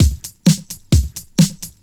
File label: drum 130 straight; Techno Trance Essentials